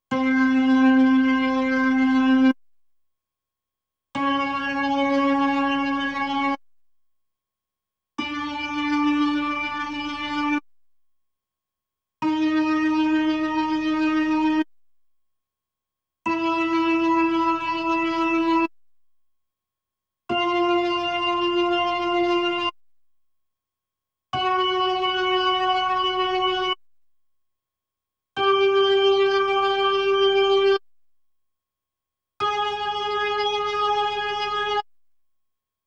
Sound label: B3 Organ; Masterbits CLIMAX 8